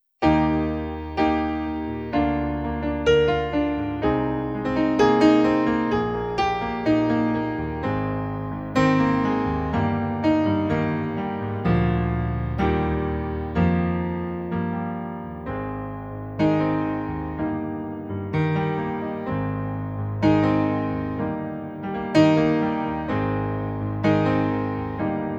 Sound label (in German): Eb Dur 63 Bpm